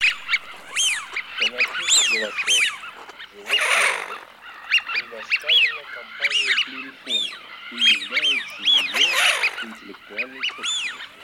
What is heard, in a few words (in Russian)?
Свиязь Fischione; plurifon